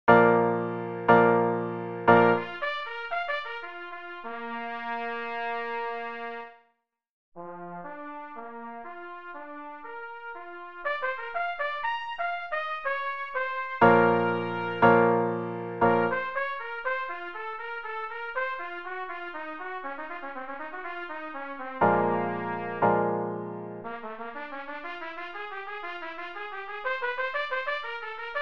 Carnival of Venice Cadenza, trumpet

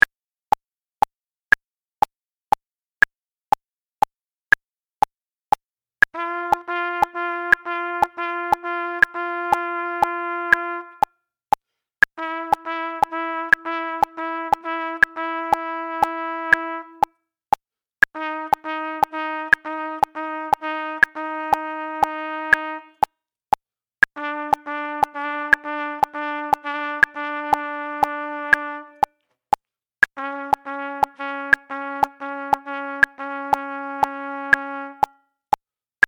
Triple Tonguing Exercises Level 1; Double Tonguing

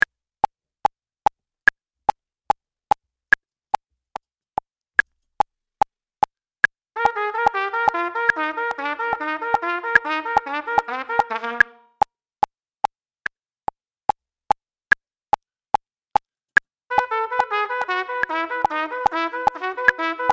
Double Tonguing Exercise Level 4

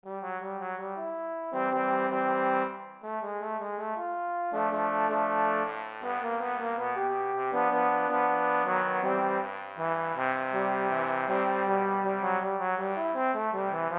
Trombones

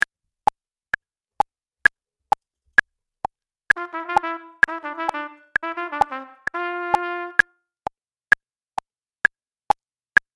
Level fastest, trumpet; Double Tonguing

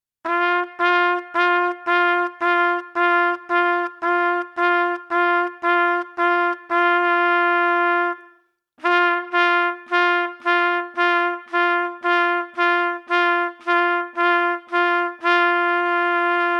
Double Tonguing Exercise Level, trumpet

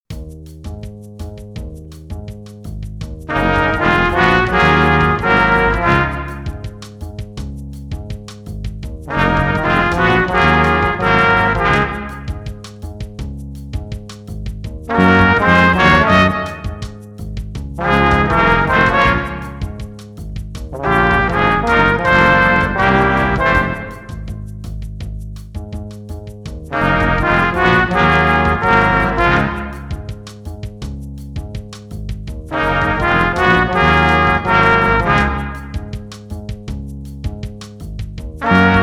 Orchestral Cute

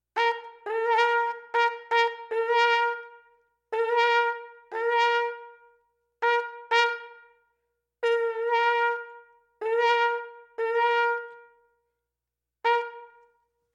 Down an Octave, trumpet

